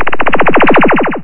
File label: Teleport; CyberBlast